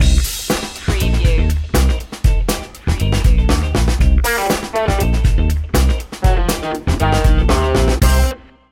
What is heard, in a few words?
groovy funk